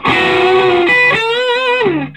SOLO, Vintage Blues Guitar; AKAI; USB Soundscan vol.22

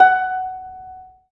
STR HARP, STR Orchestral

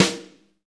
DRM BRUSH, Brushes; Roland - Rhythm Section

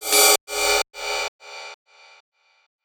Gated Cymbal; musicradar

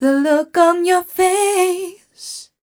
f mel e flat, fem Vocals

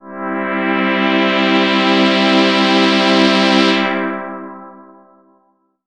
E3, Synth Hits; Zero-G; Soundclash Nu Dance Electro; Acidized

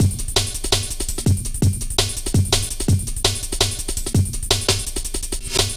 Drum Loops, Jungle

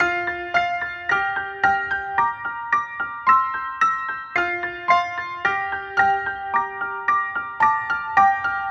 Chime Piano, Trip-Hop Loops; Sonic Foundry (Sony Creative Software); Rondo Brothers Trip-Hop Loops & Samples; Apologists

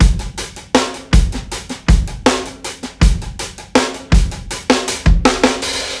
ROOMY BEAT, breaks, drums; Basement drums vol 2

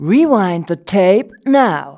VOX VOX; Sound & Vision - Gigapack II CD 2

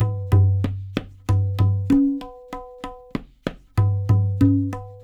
LOOPS, Percussionworks; Sampleheads; NYC Percussionworks; 90 s Sample CDs

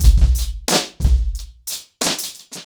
90BPM, acoustic drums; The Roots Drumloops

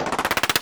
GUN ROLL; Distored Reality

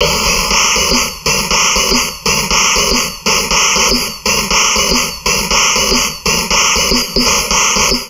Drum Loops Crazy Processed

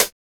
CRUMMY CHH, LOFI HATS

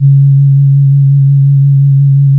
C#, Synclav FM, WIND; Big Fish Audio

